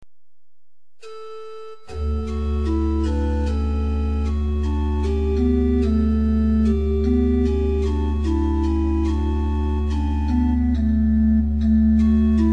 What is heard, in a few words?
Organ Books, Temperament Equal; Rohr F 8; hymn